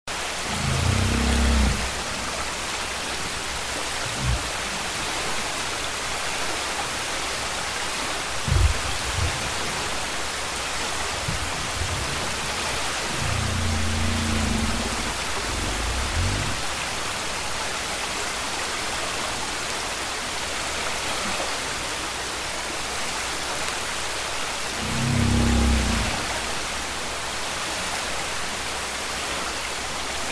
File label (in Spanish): canto de ballena jorobada